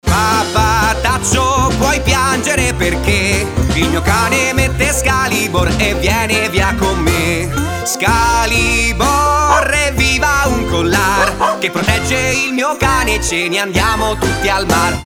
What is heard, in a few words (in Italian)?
Spot cantato; scalibor